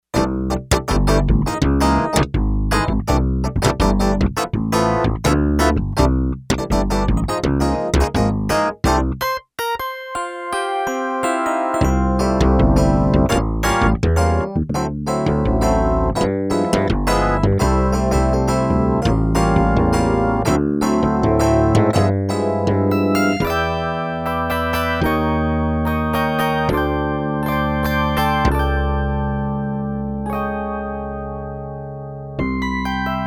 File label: Funkbass E. Piano, Yamaha TX802; Performances; Factory Patches